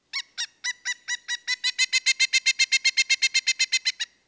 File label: pileated woodpecker, songs